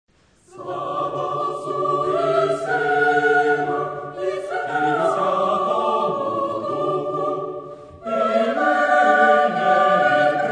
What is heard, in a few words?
Chorus; Slava Otcu I Sinu